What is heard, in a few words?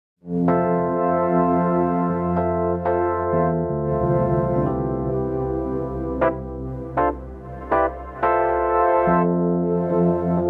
Blur keys